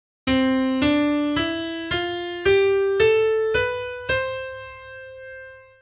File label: C major scale